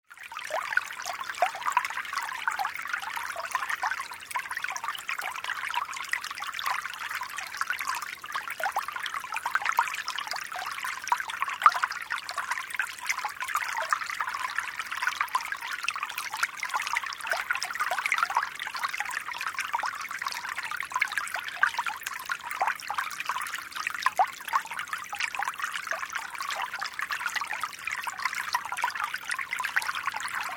Brook 1 - Small light stream running over pebbles - water feature - small fountain; Rivers; Nature